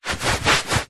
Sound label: foley towel rub body skin short